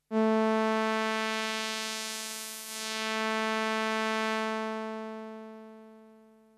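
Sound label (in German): Filter Hüllkurve Ohne Resonanz; virusc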